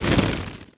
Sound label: buzz soft, antlion wings; creatures